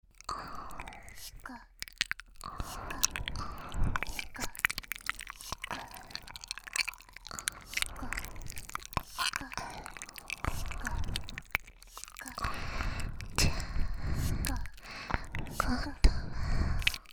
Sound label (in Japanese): 単調テトテト耳舐めと単調グリグリ耳舐めコンボ, ASMR; トンネルの奥へ